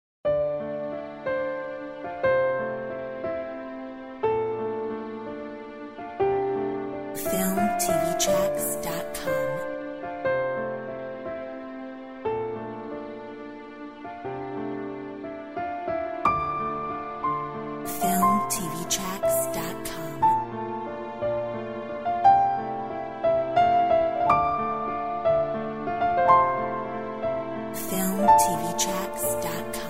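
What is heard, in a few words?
contemplating orchestral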